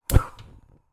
oven stove gas ignite; household

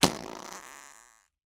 human fart sudden explode

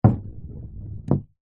snooker ball roll snooker table hit table edge; sport

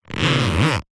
plastic rub squeak with hand; foley

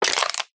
food drink soda can empty stand on squash; zapsplat

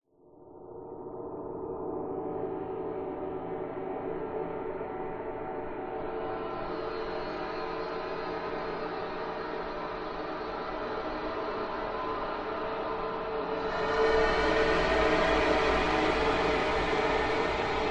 horror atmosphere dark evil airy

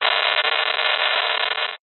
science fiction radio signal interference burst

